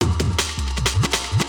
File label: drum loop D+B 160; Drum+Bass